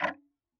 Tick, Clocks Kitchen Clock

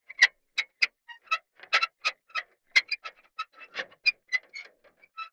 Sailing

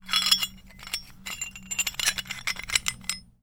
Hole Saw Rattle, Hole Saw Bits

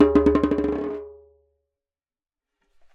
Jembe Bounce; Percussion Samples

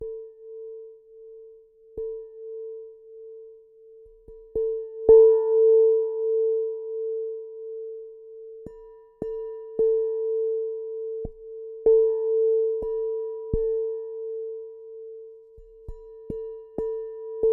Marimba Beater Montage Hits, Large Wine Glass

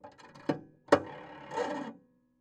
PayPhone

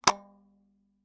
Taxi Meter

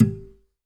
Classical Guitar Damped Pluck

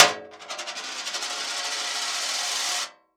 Coin On Metal Tray; Coins

